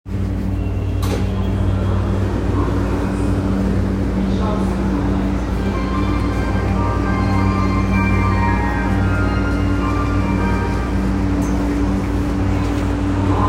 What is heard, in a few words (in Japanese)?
半蔵門線錦糸町西, train sounds